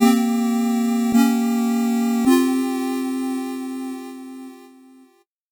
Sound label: 8bit jingle normal